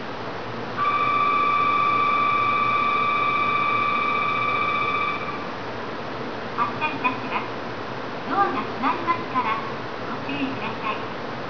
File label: higashifunabashi bell; jreast; sobu